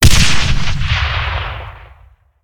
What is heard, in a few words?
explosion; gusanos promode